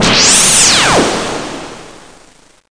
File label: se gun